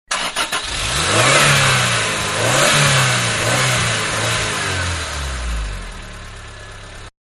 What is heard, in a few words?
Car Small-Start And Accelerate; Cars (Sound Effects:Vehicles); Manhattan Production Music